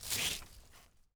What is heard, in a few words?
plant cut; gmod